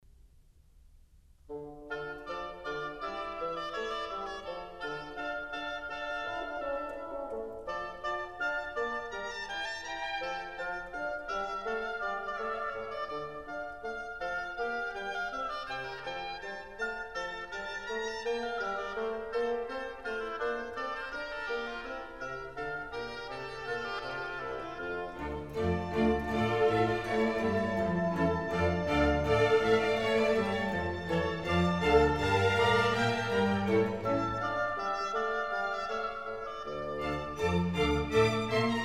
Water Music Suite

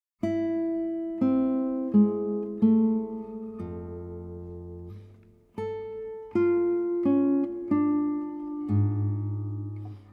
guitar suite in A minor, sarabanda; Johann Sebastian Bach

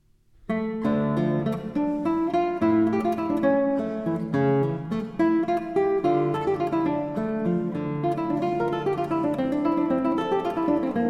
Violin Partita No 2 in D Minor, for guitar, Giga; Bach Transcriptions and Arrangements for Guitar

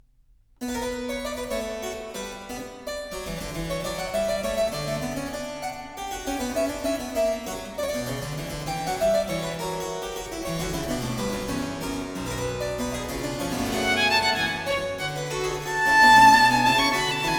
Allegro, Sonatas for Violin and Harpsichord; Sonata No. 4 in C Minor, BWV 1017; Bach; J.S. Bach

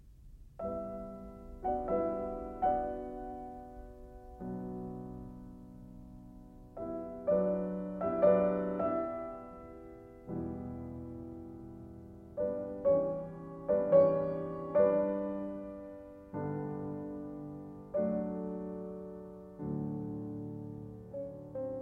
Prelude in B minor, piano; 24 Preludes

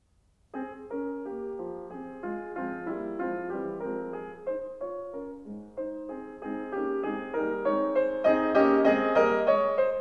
Piano Sonata No. 1 in F minor; Wilhelm Kempff; Beethoven; Piano Sonatas

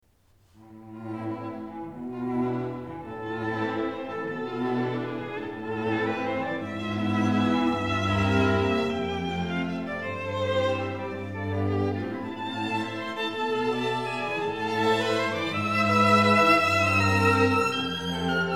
String Quartet in A minor, Allegro appassionato; String Quartet No.15; Op.132; Ludwig van Beethoven

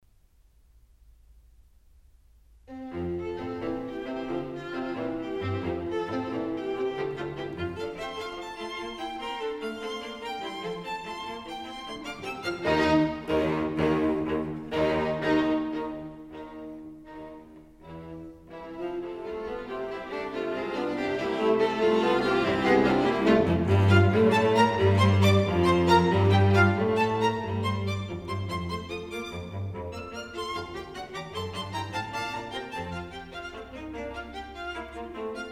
String Quartet in F major

